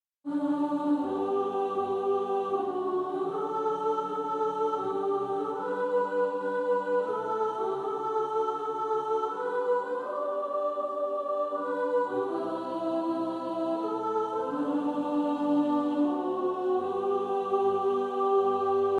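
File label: soprano, practice music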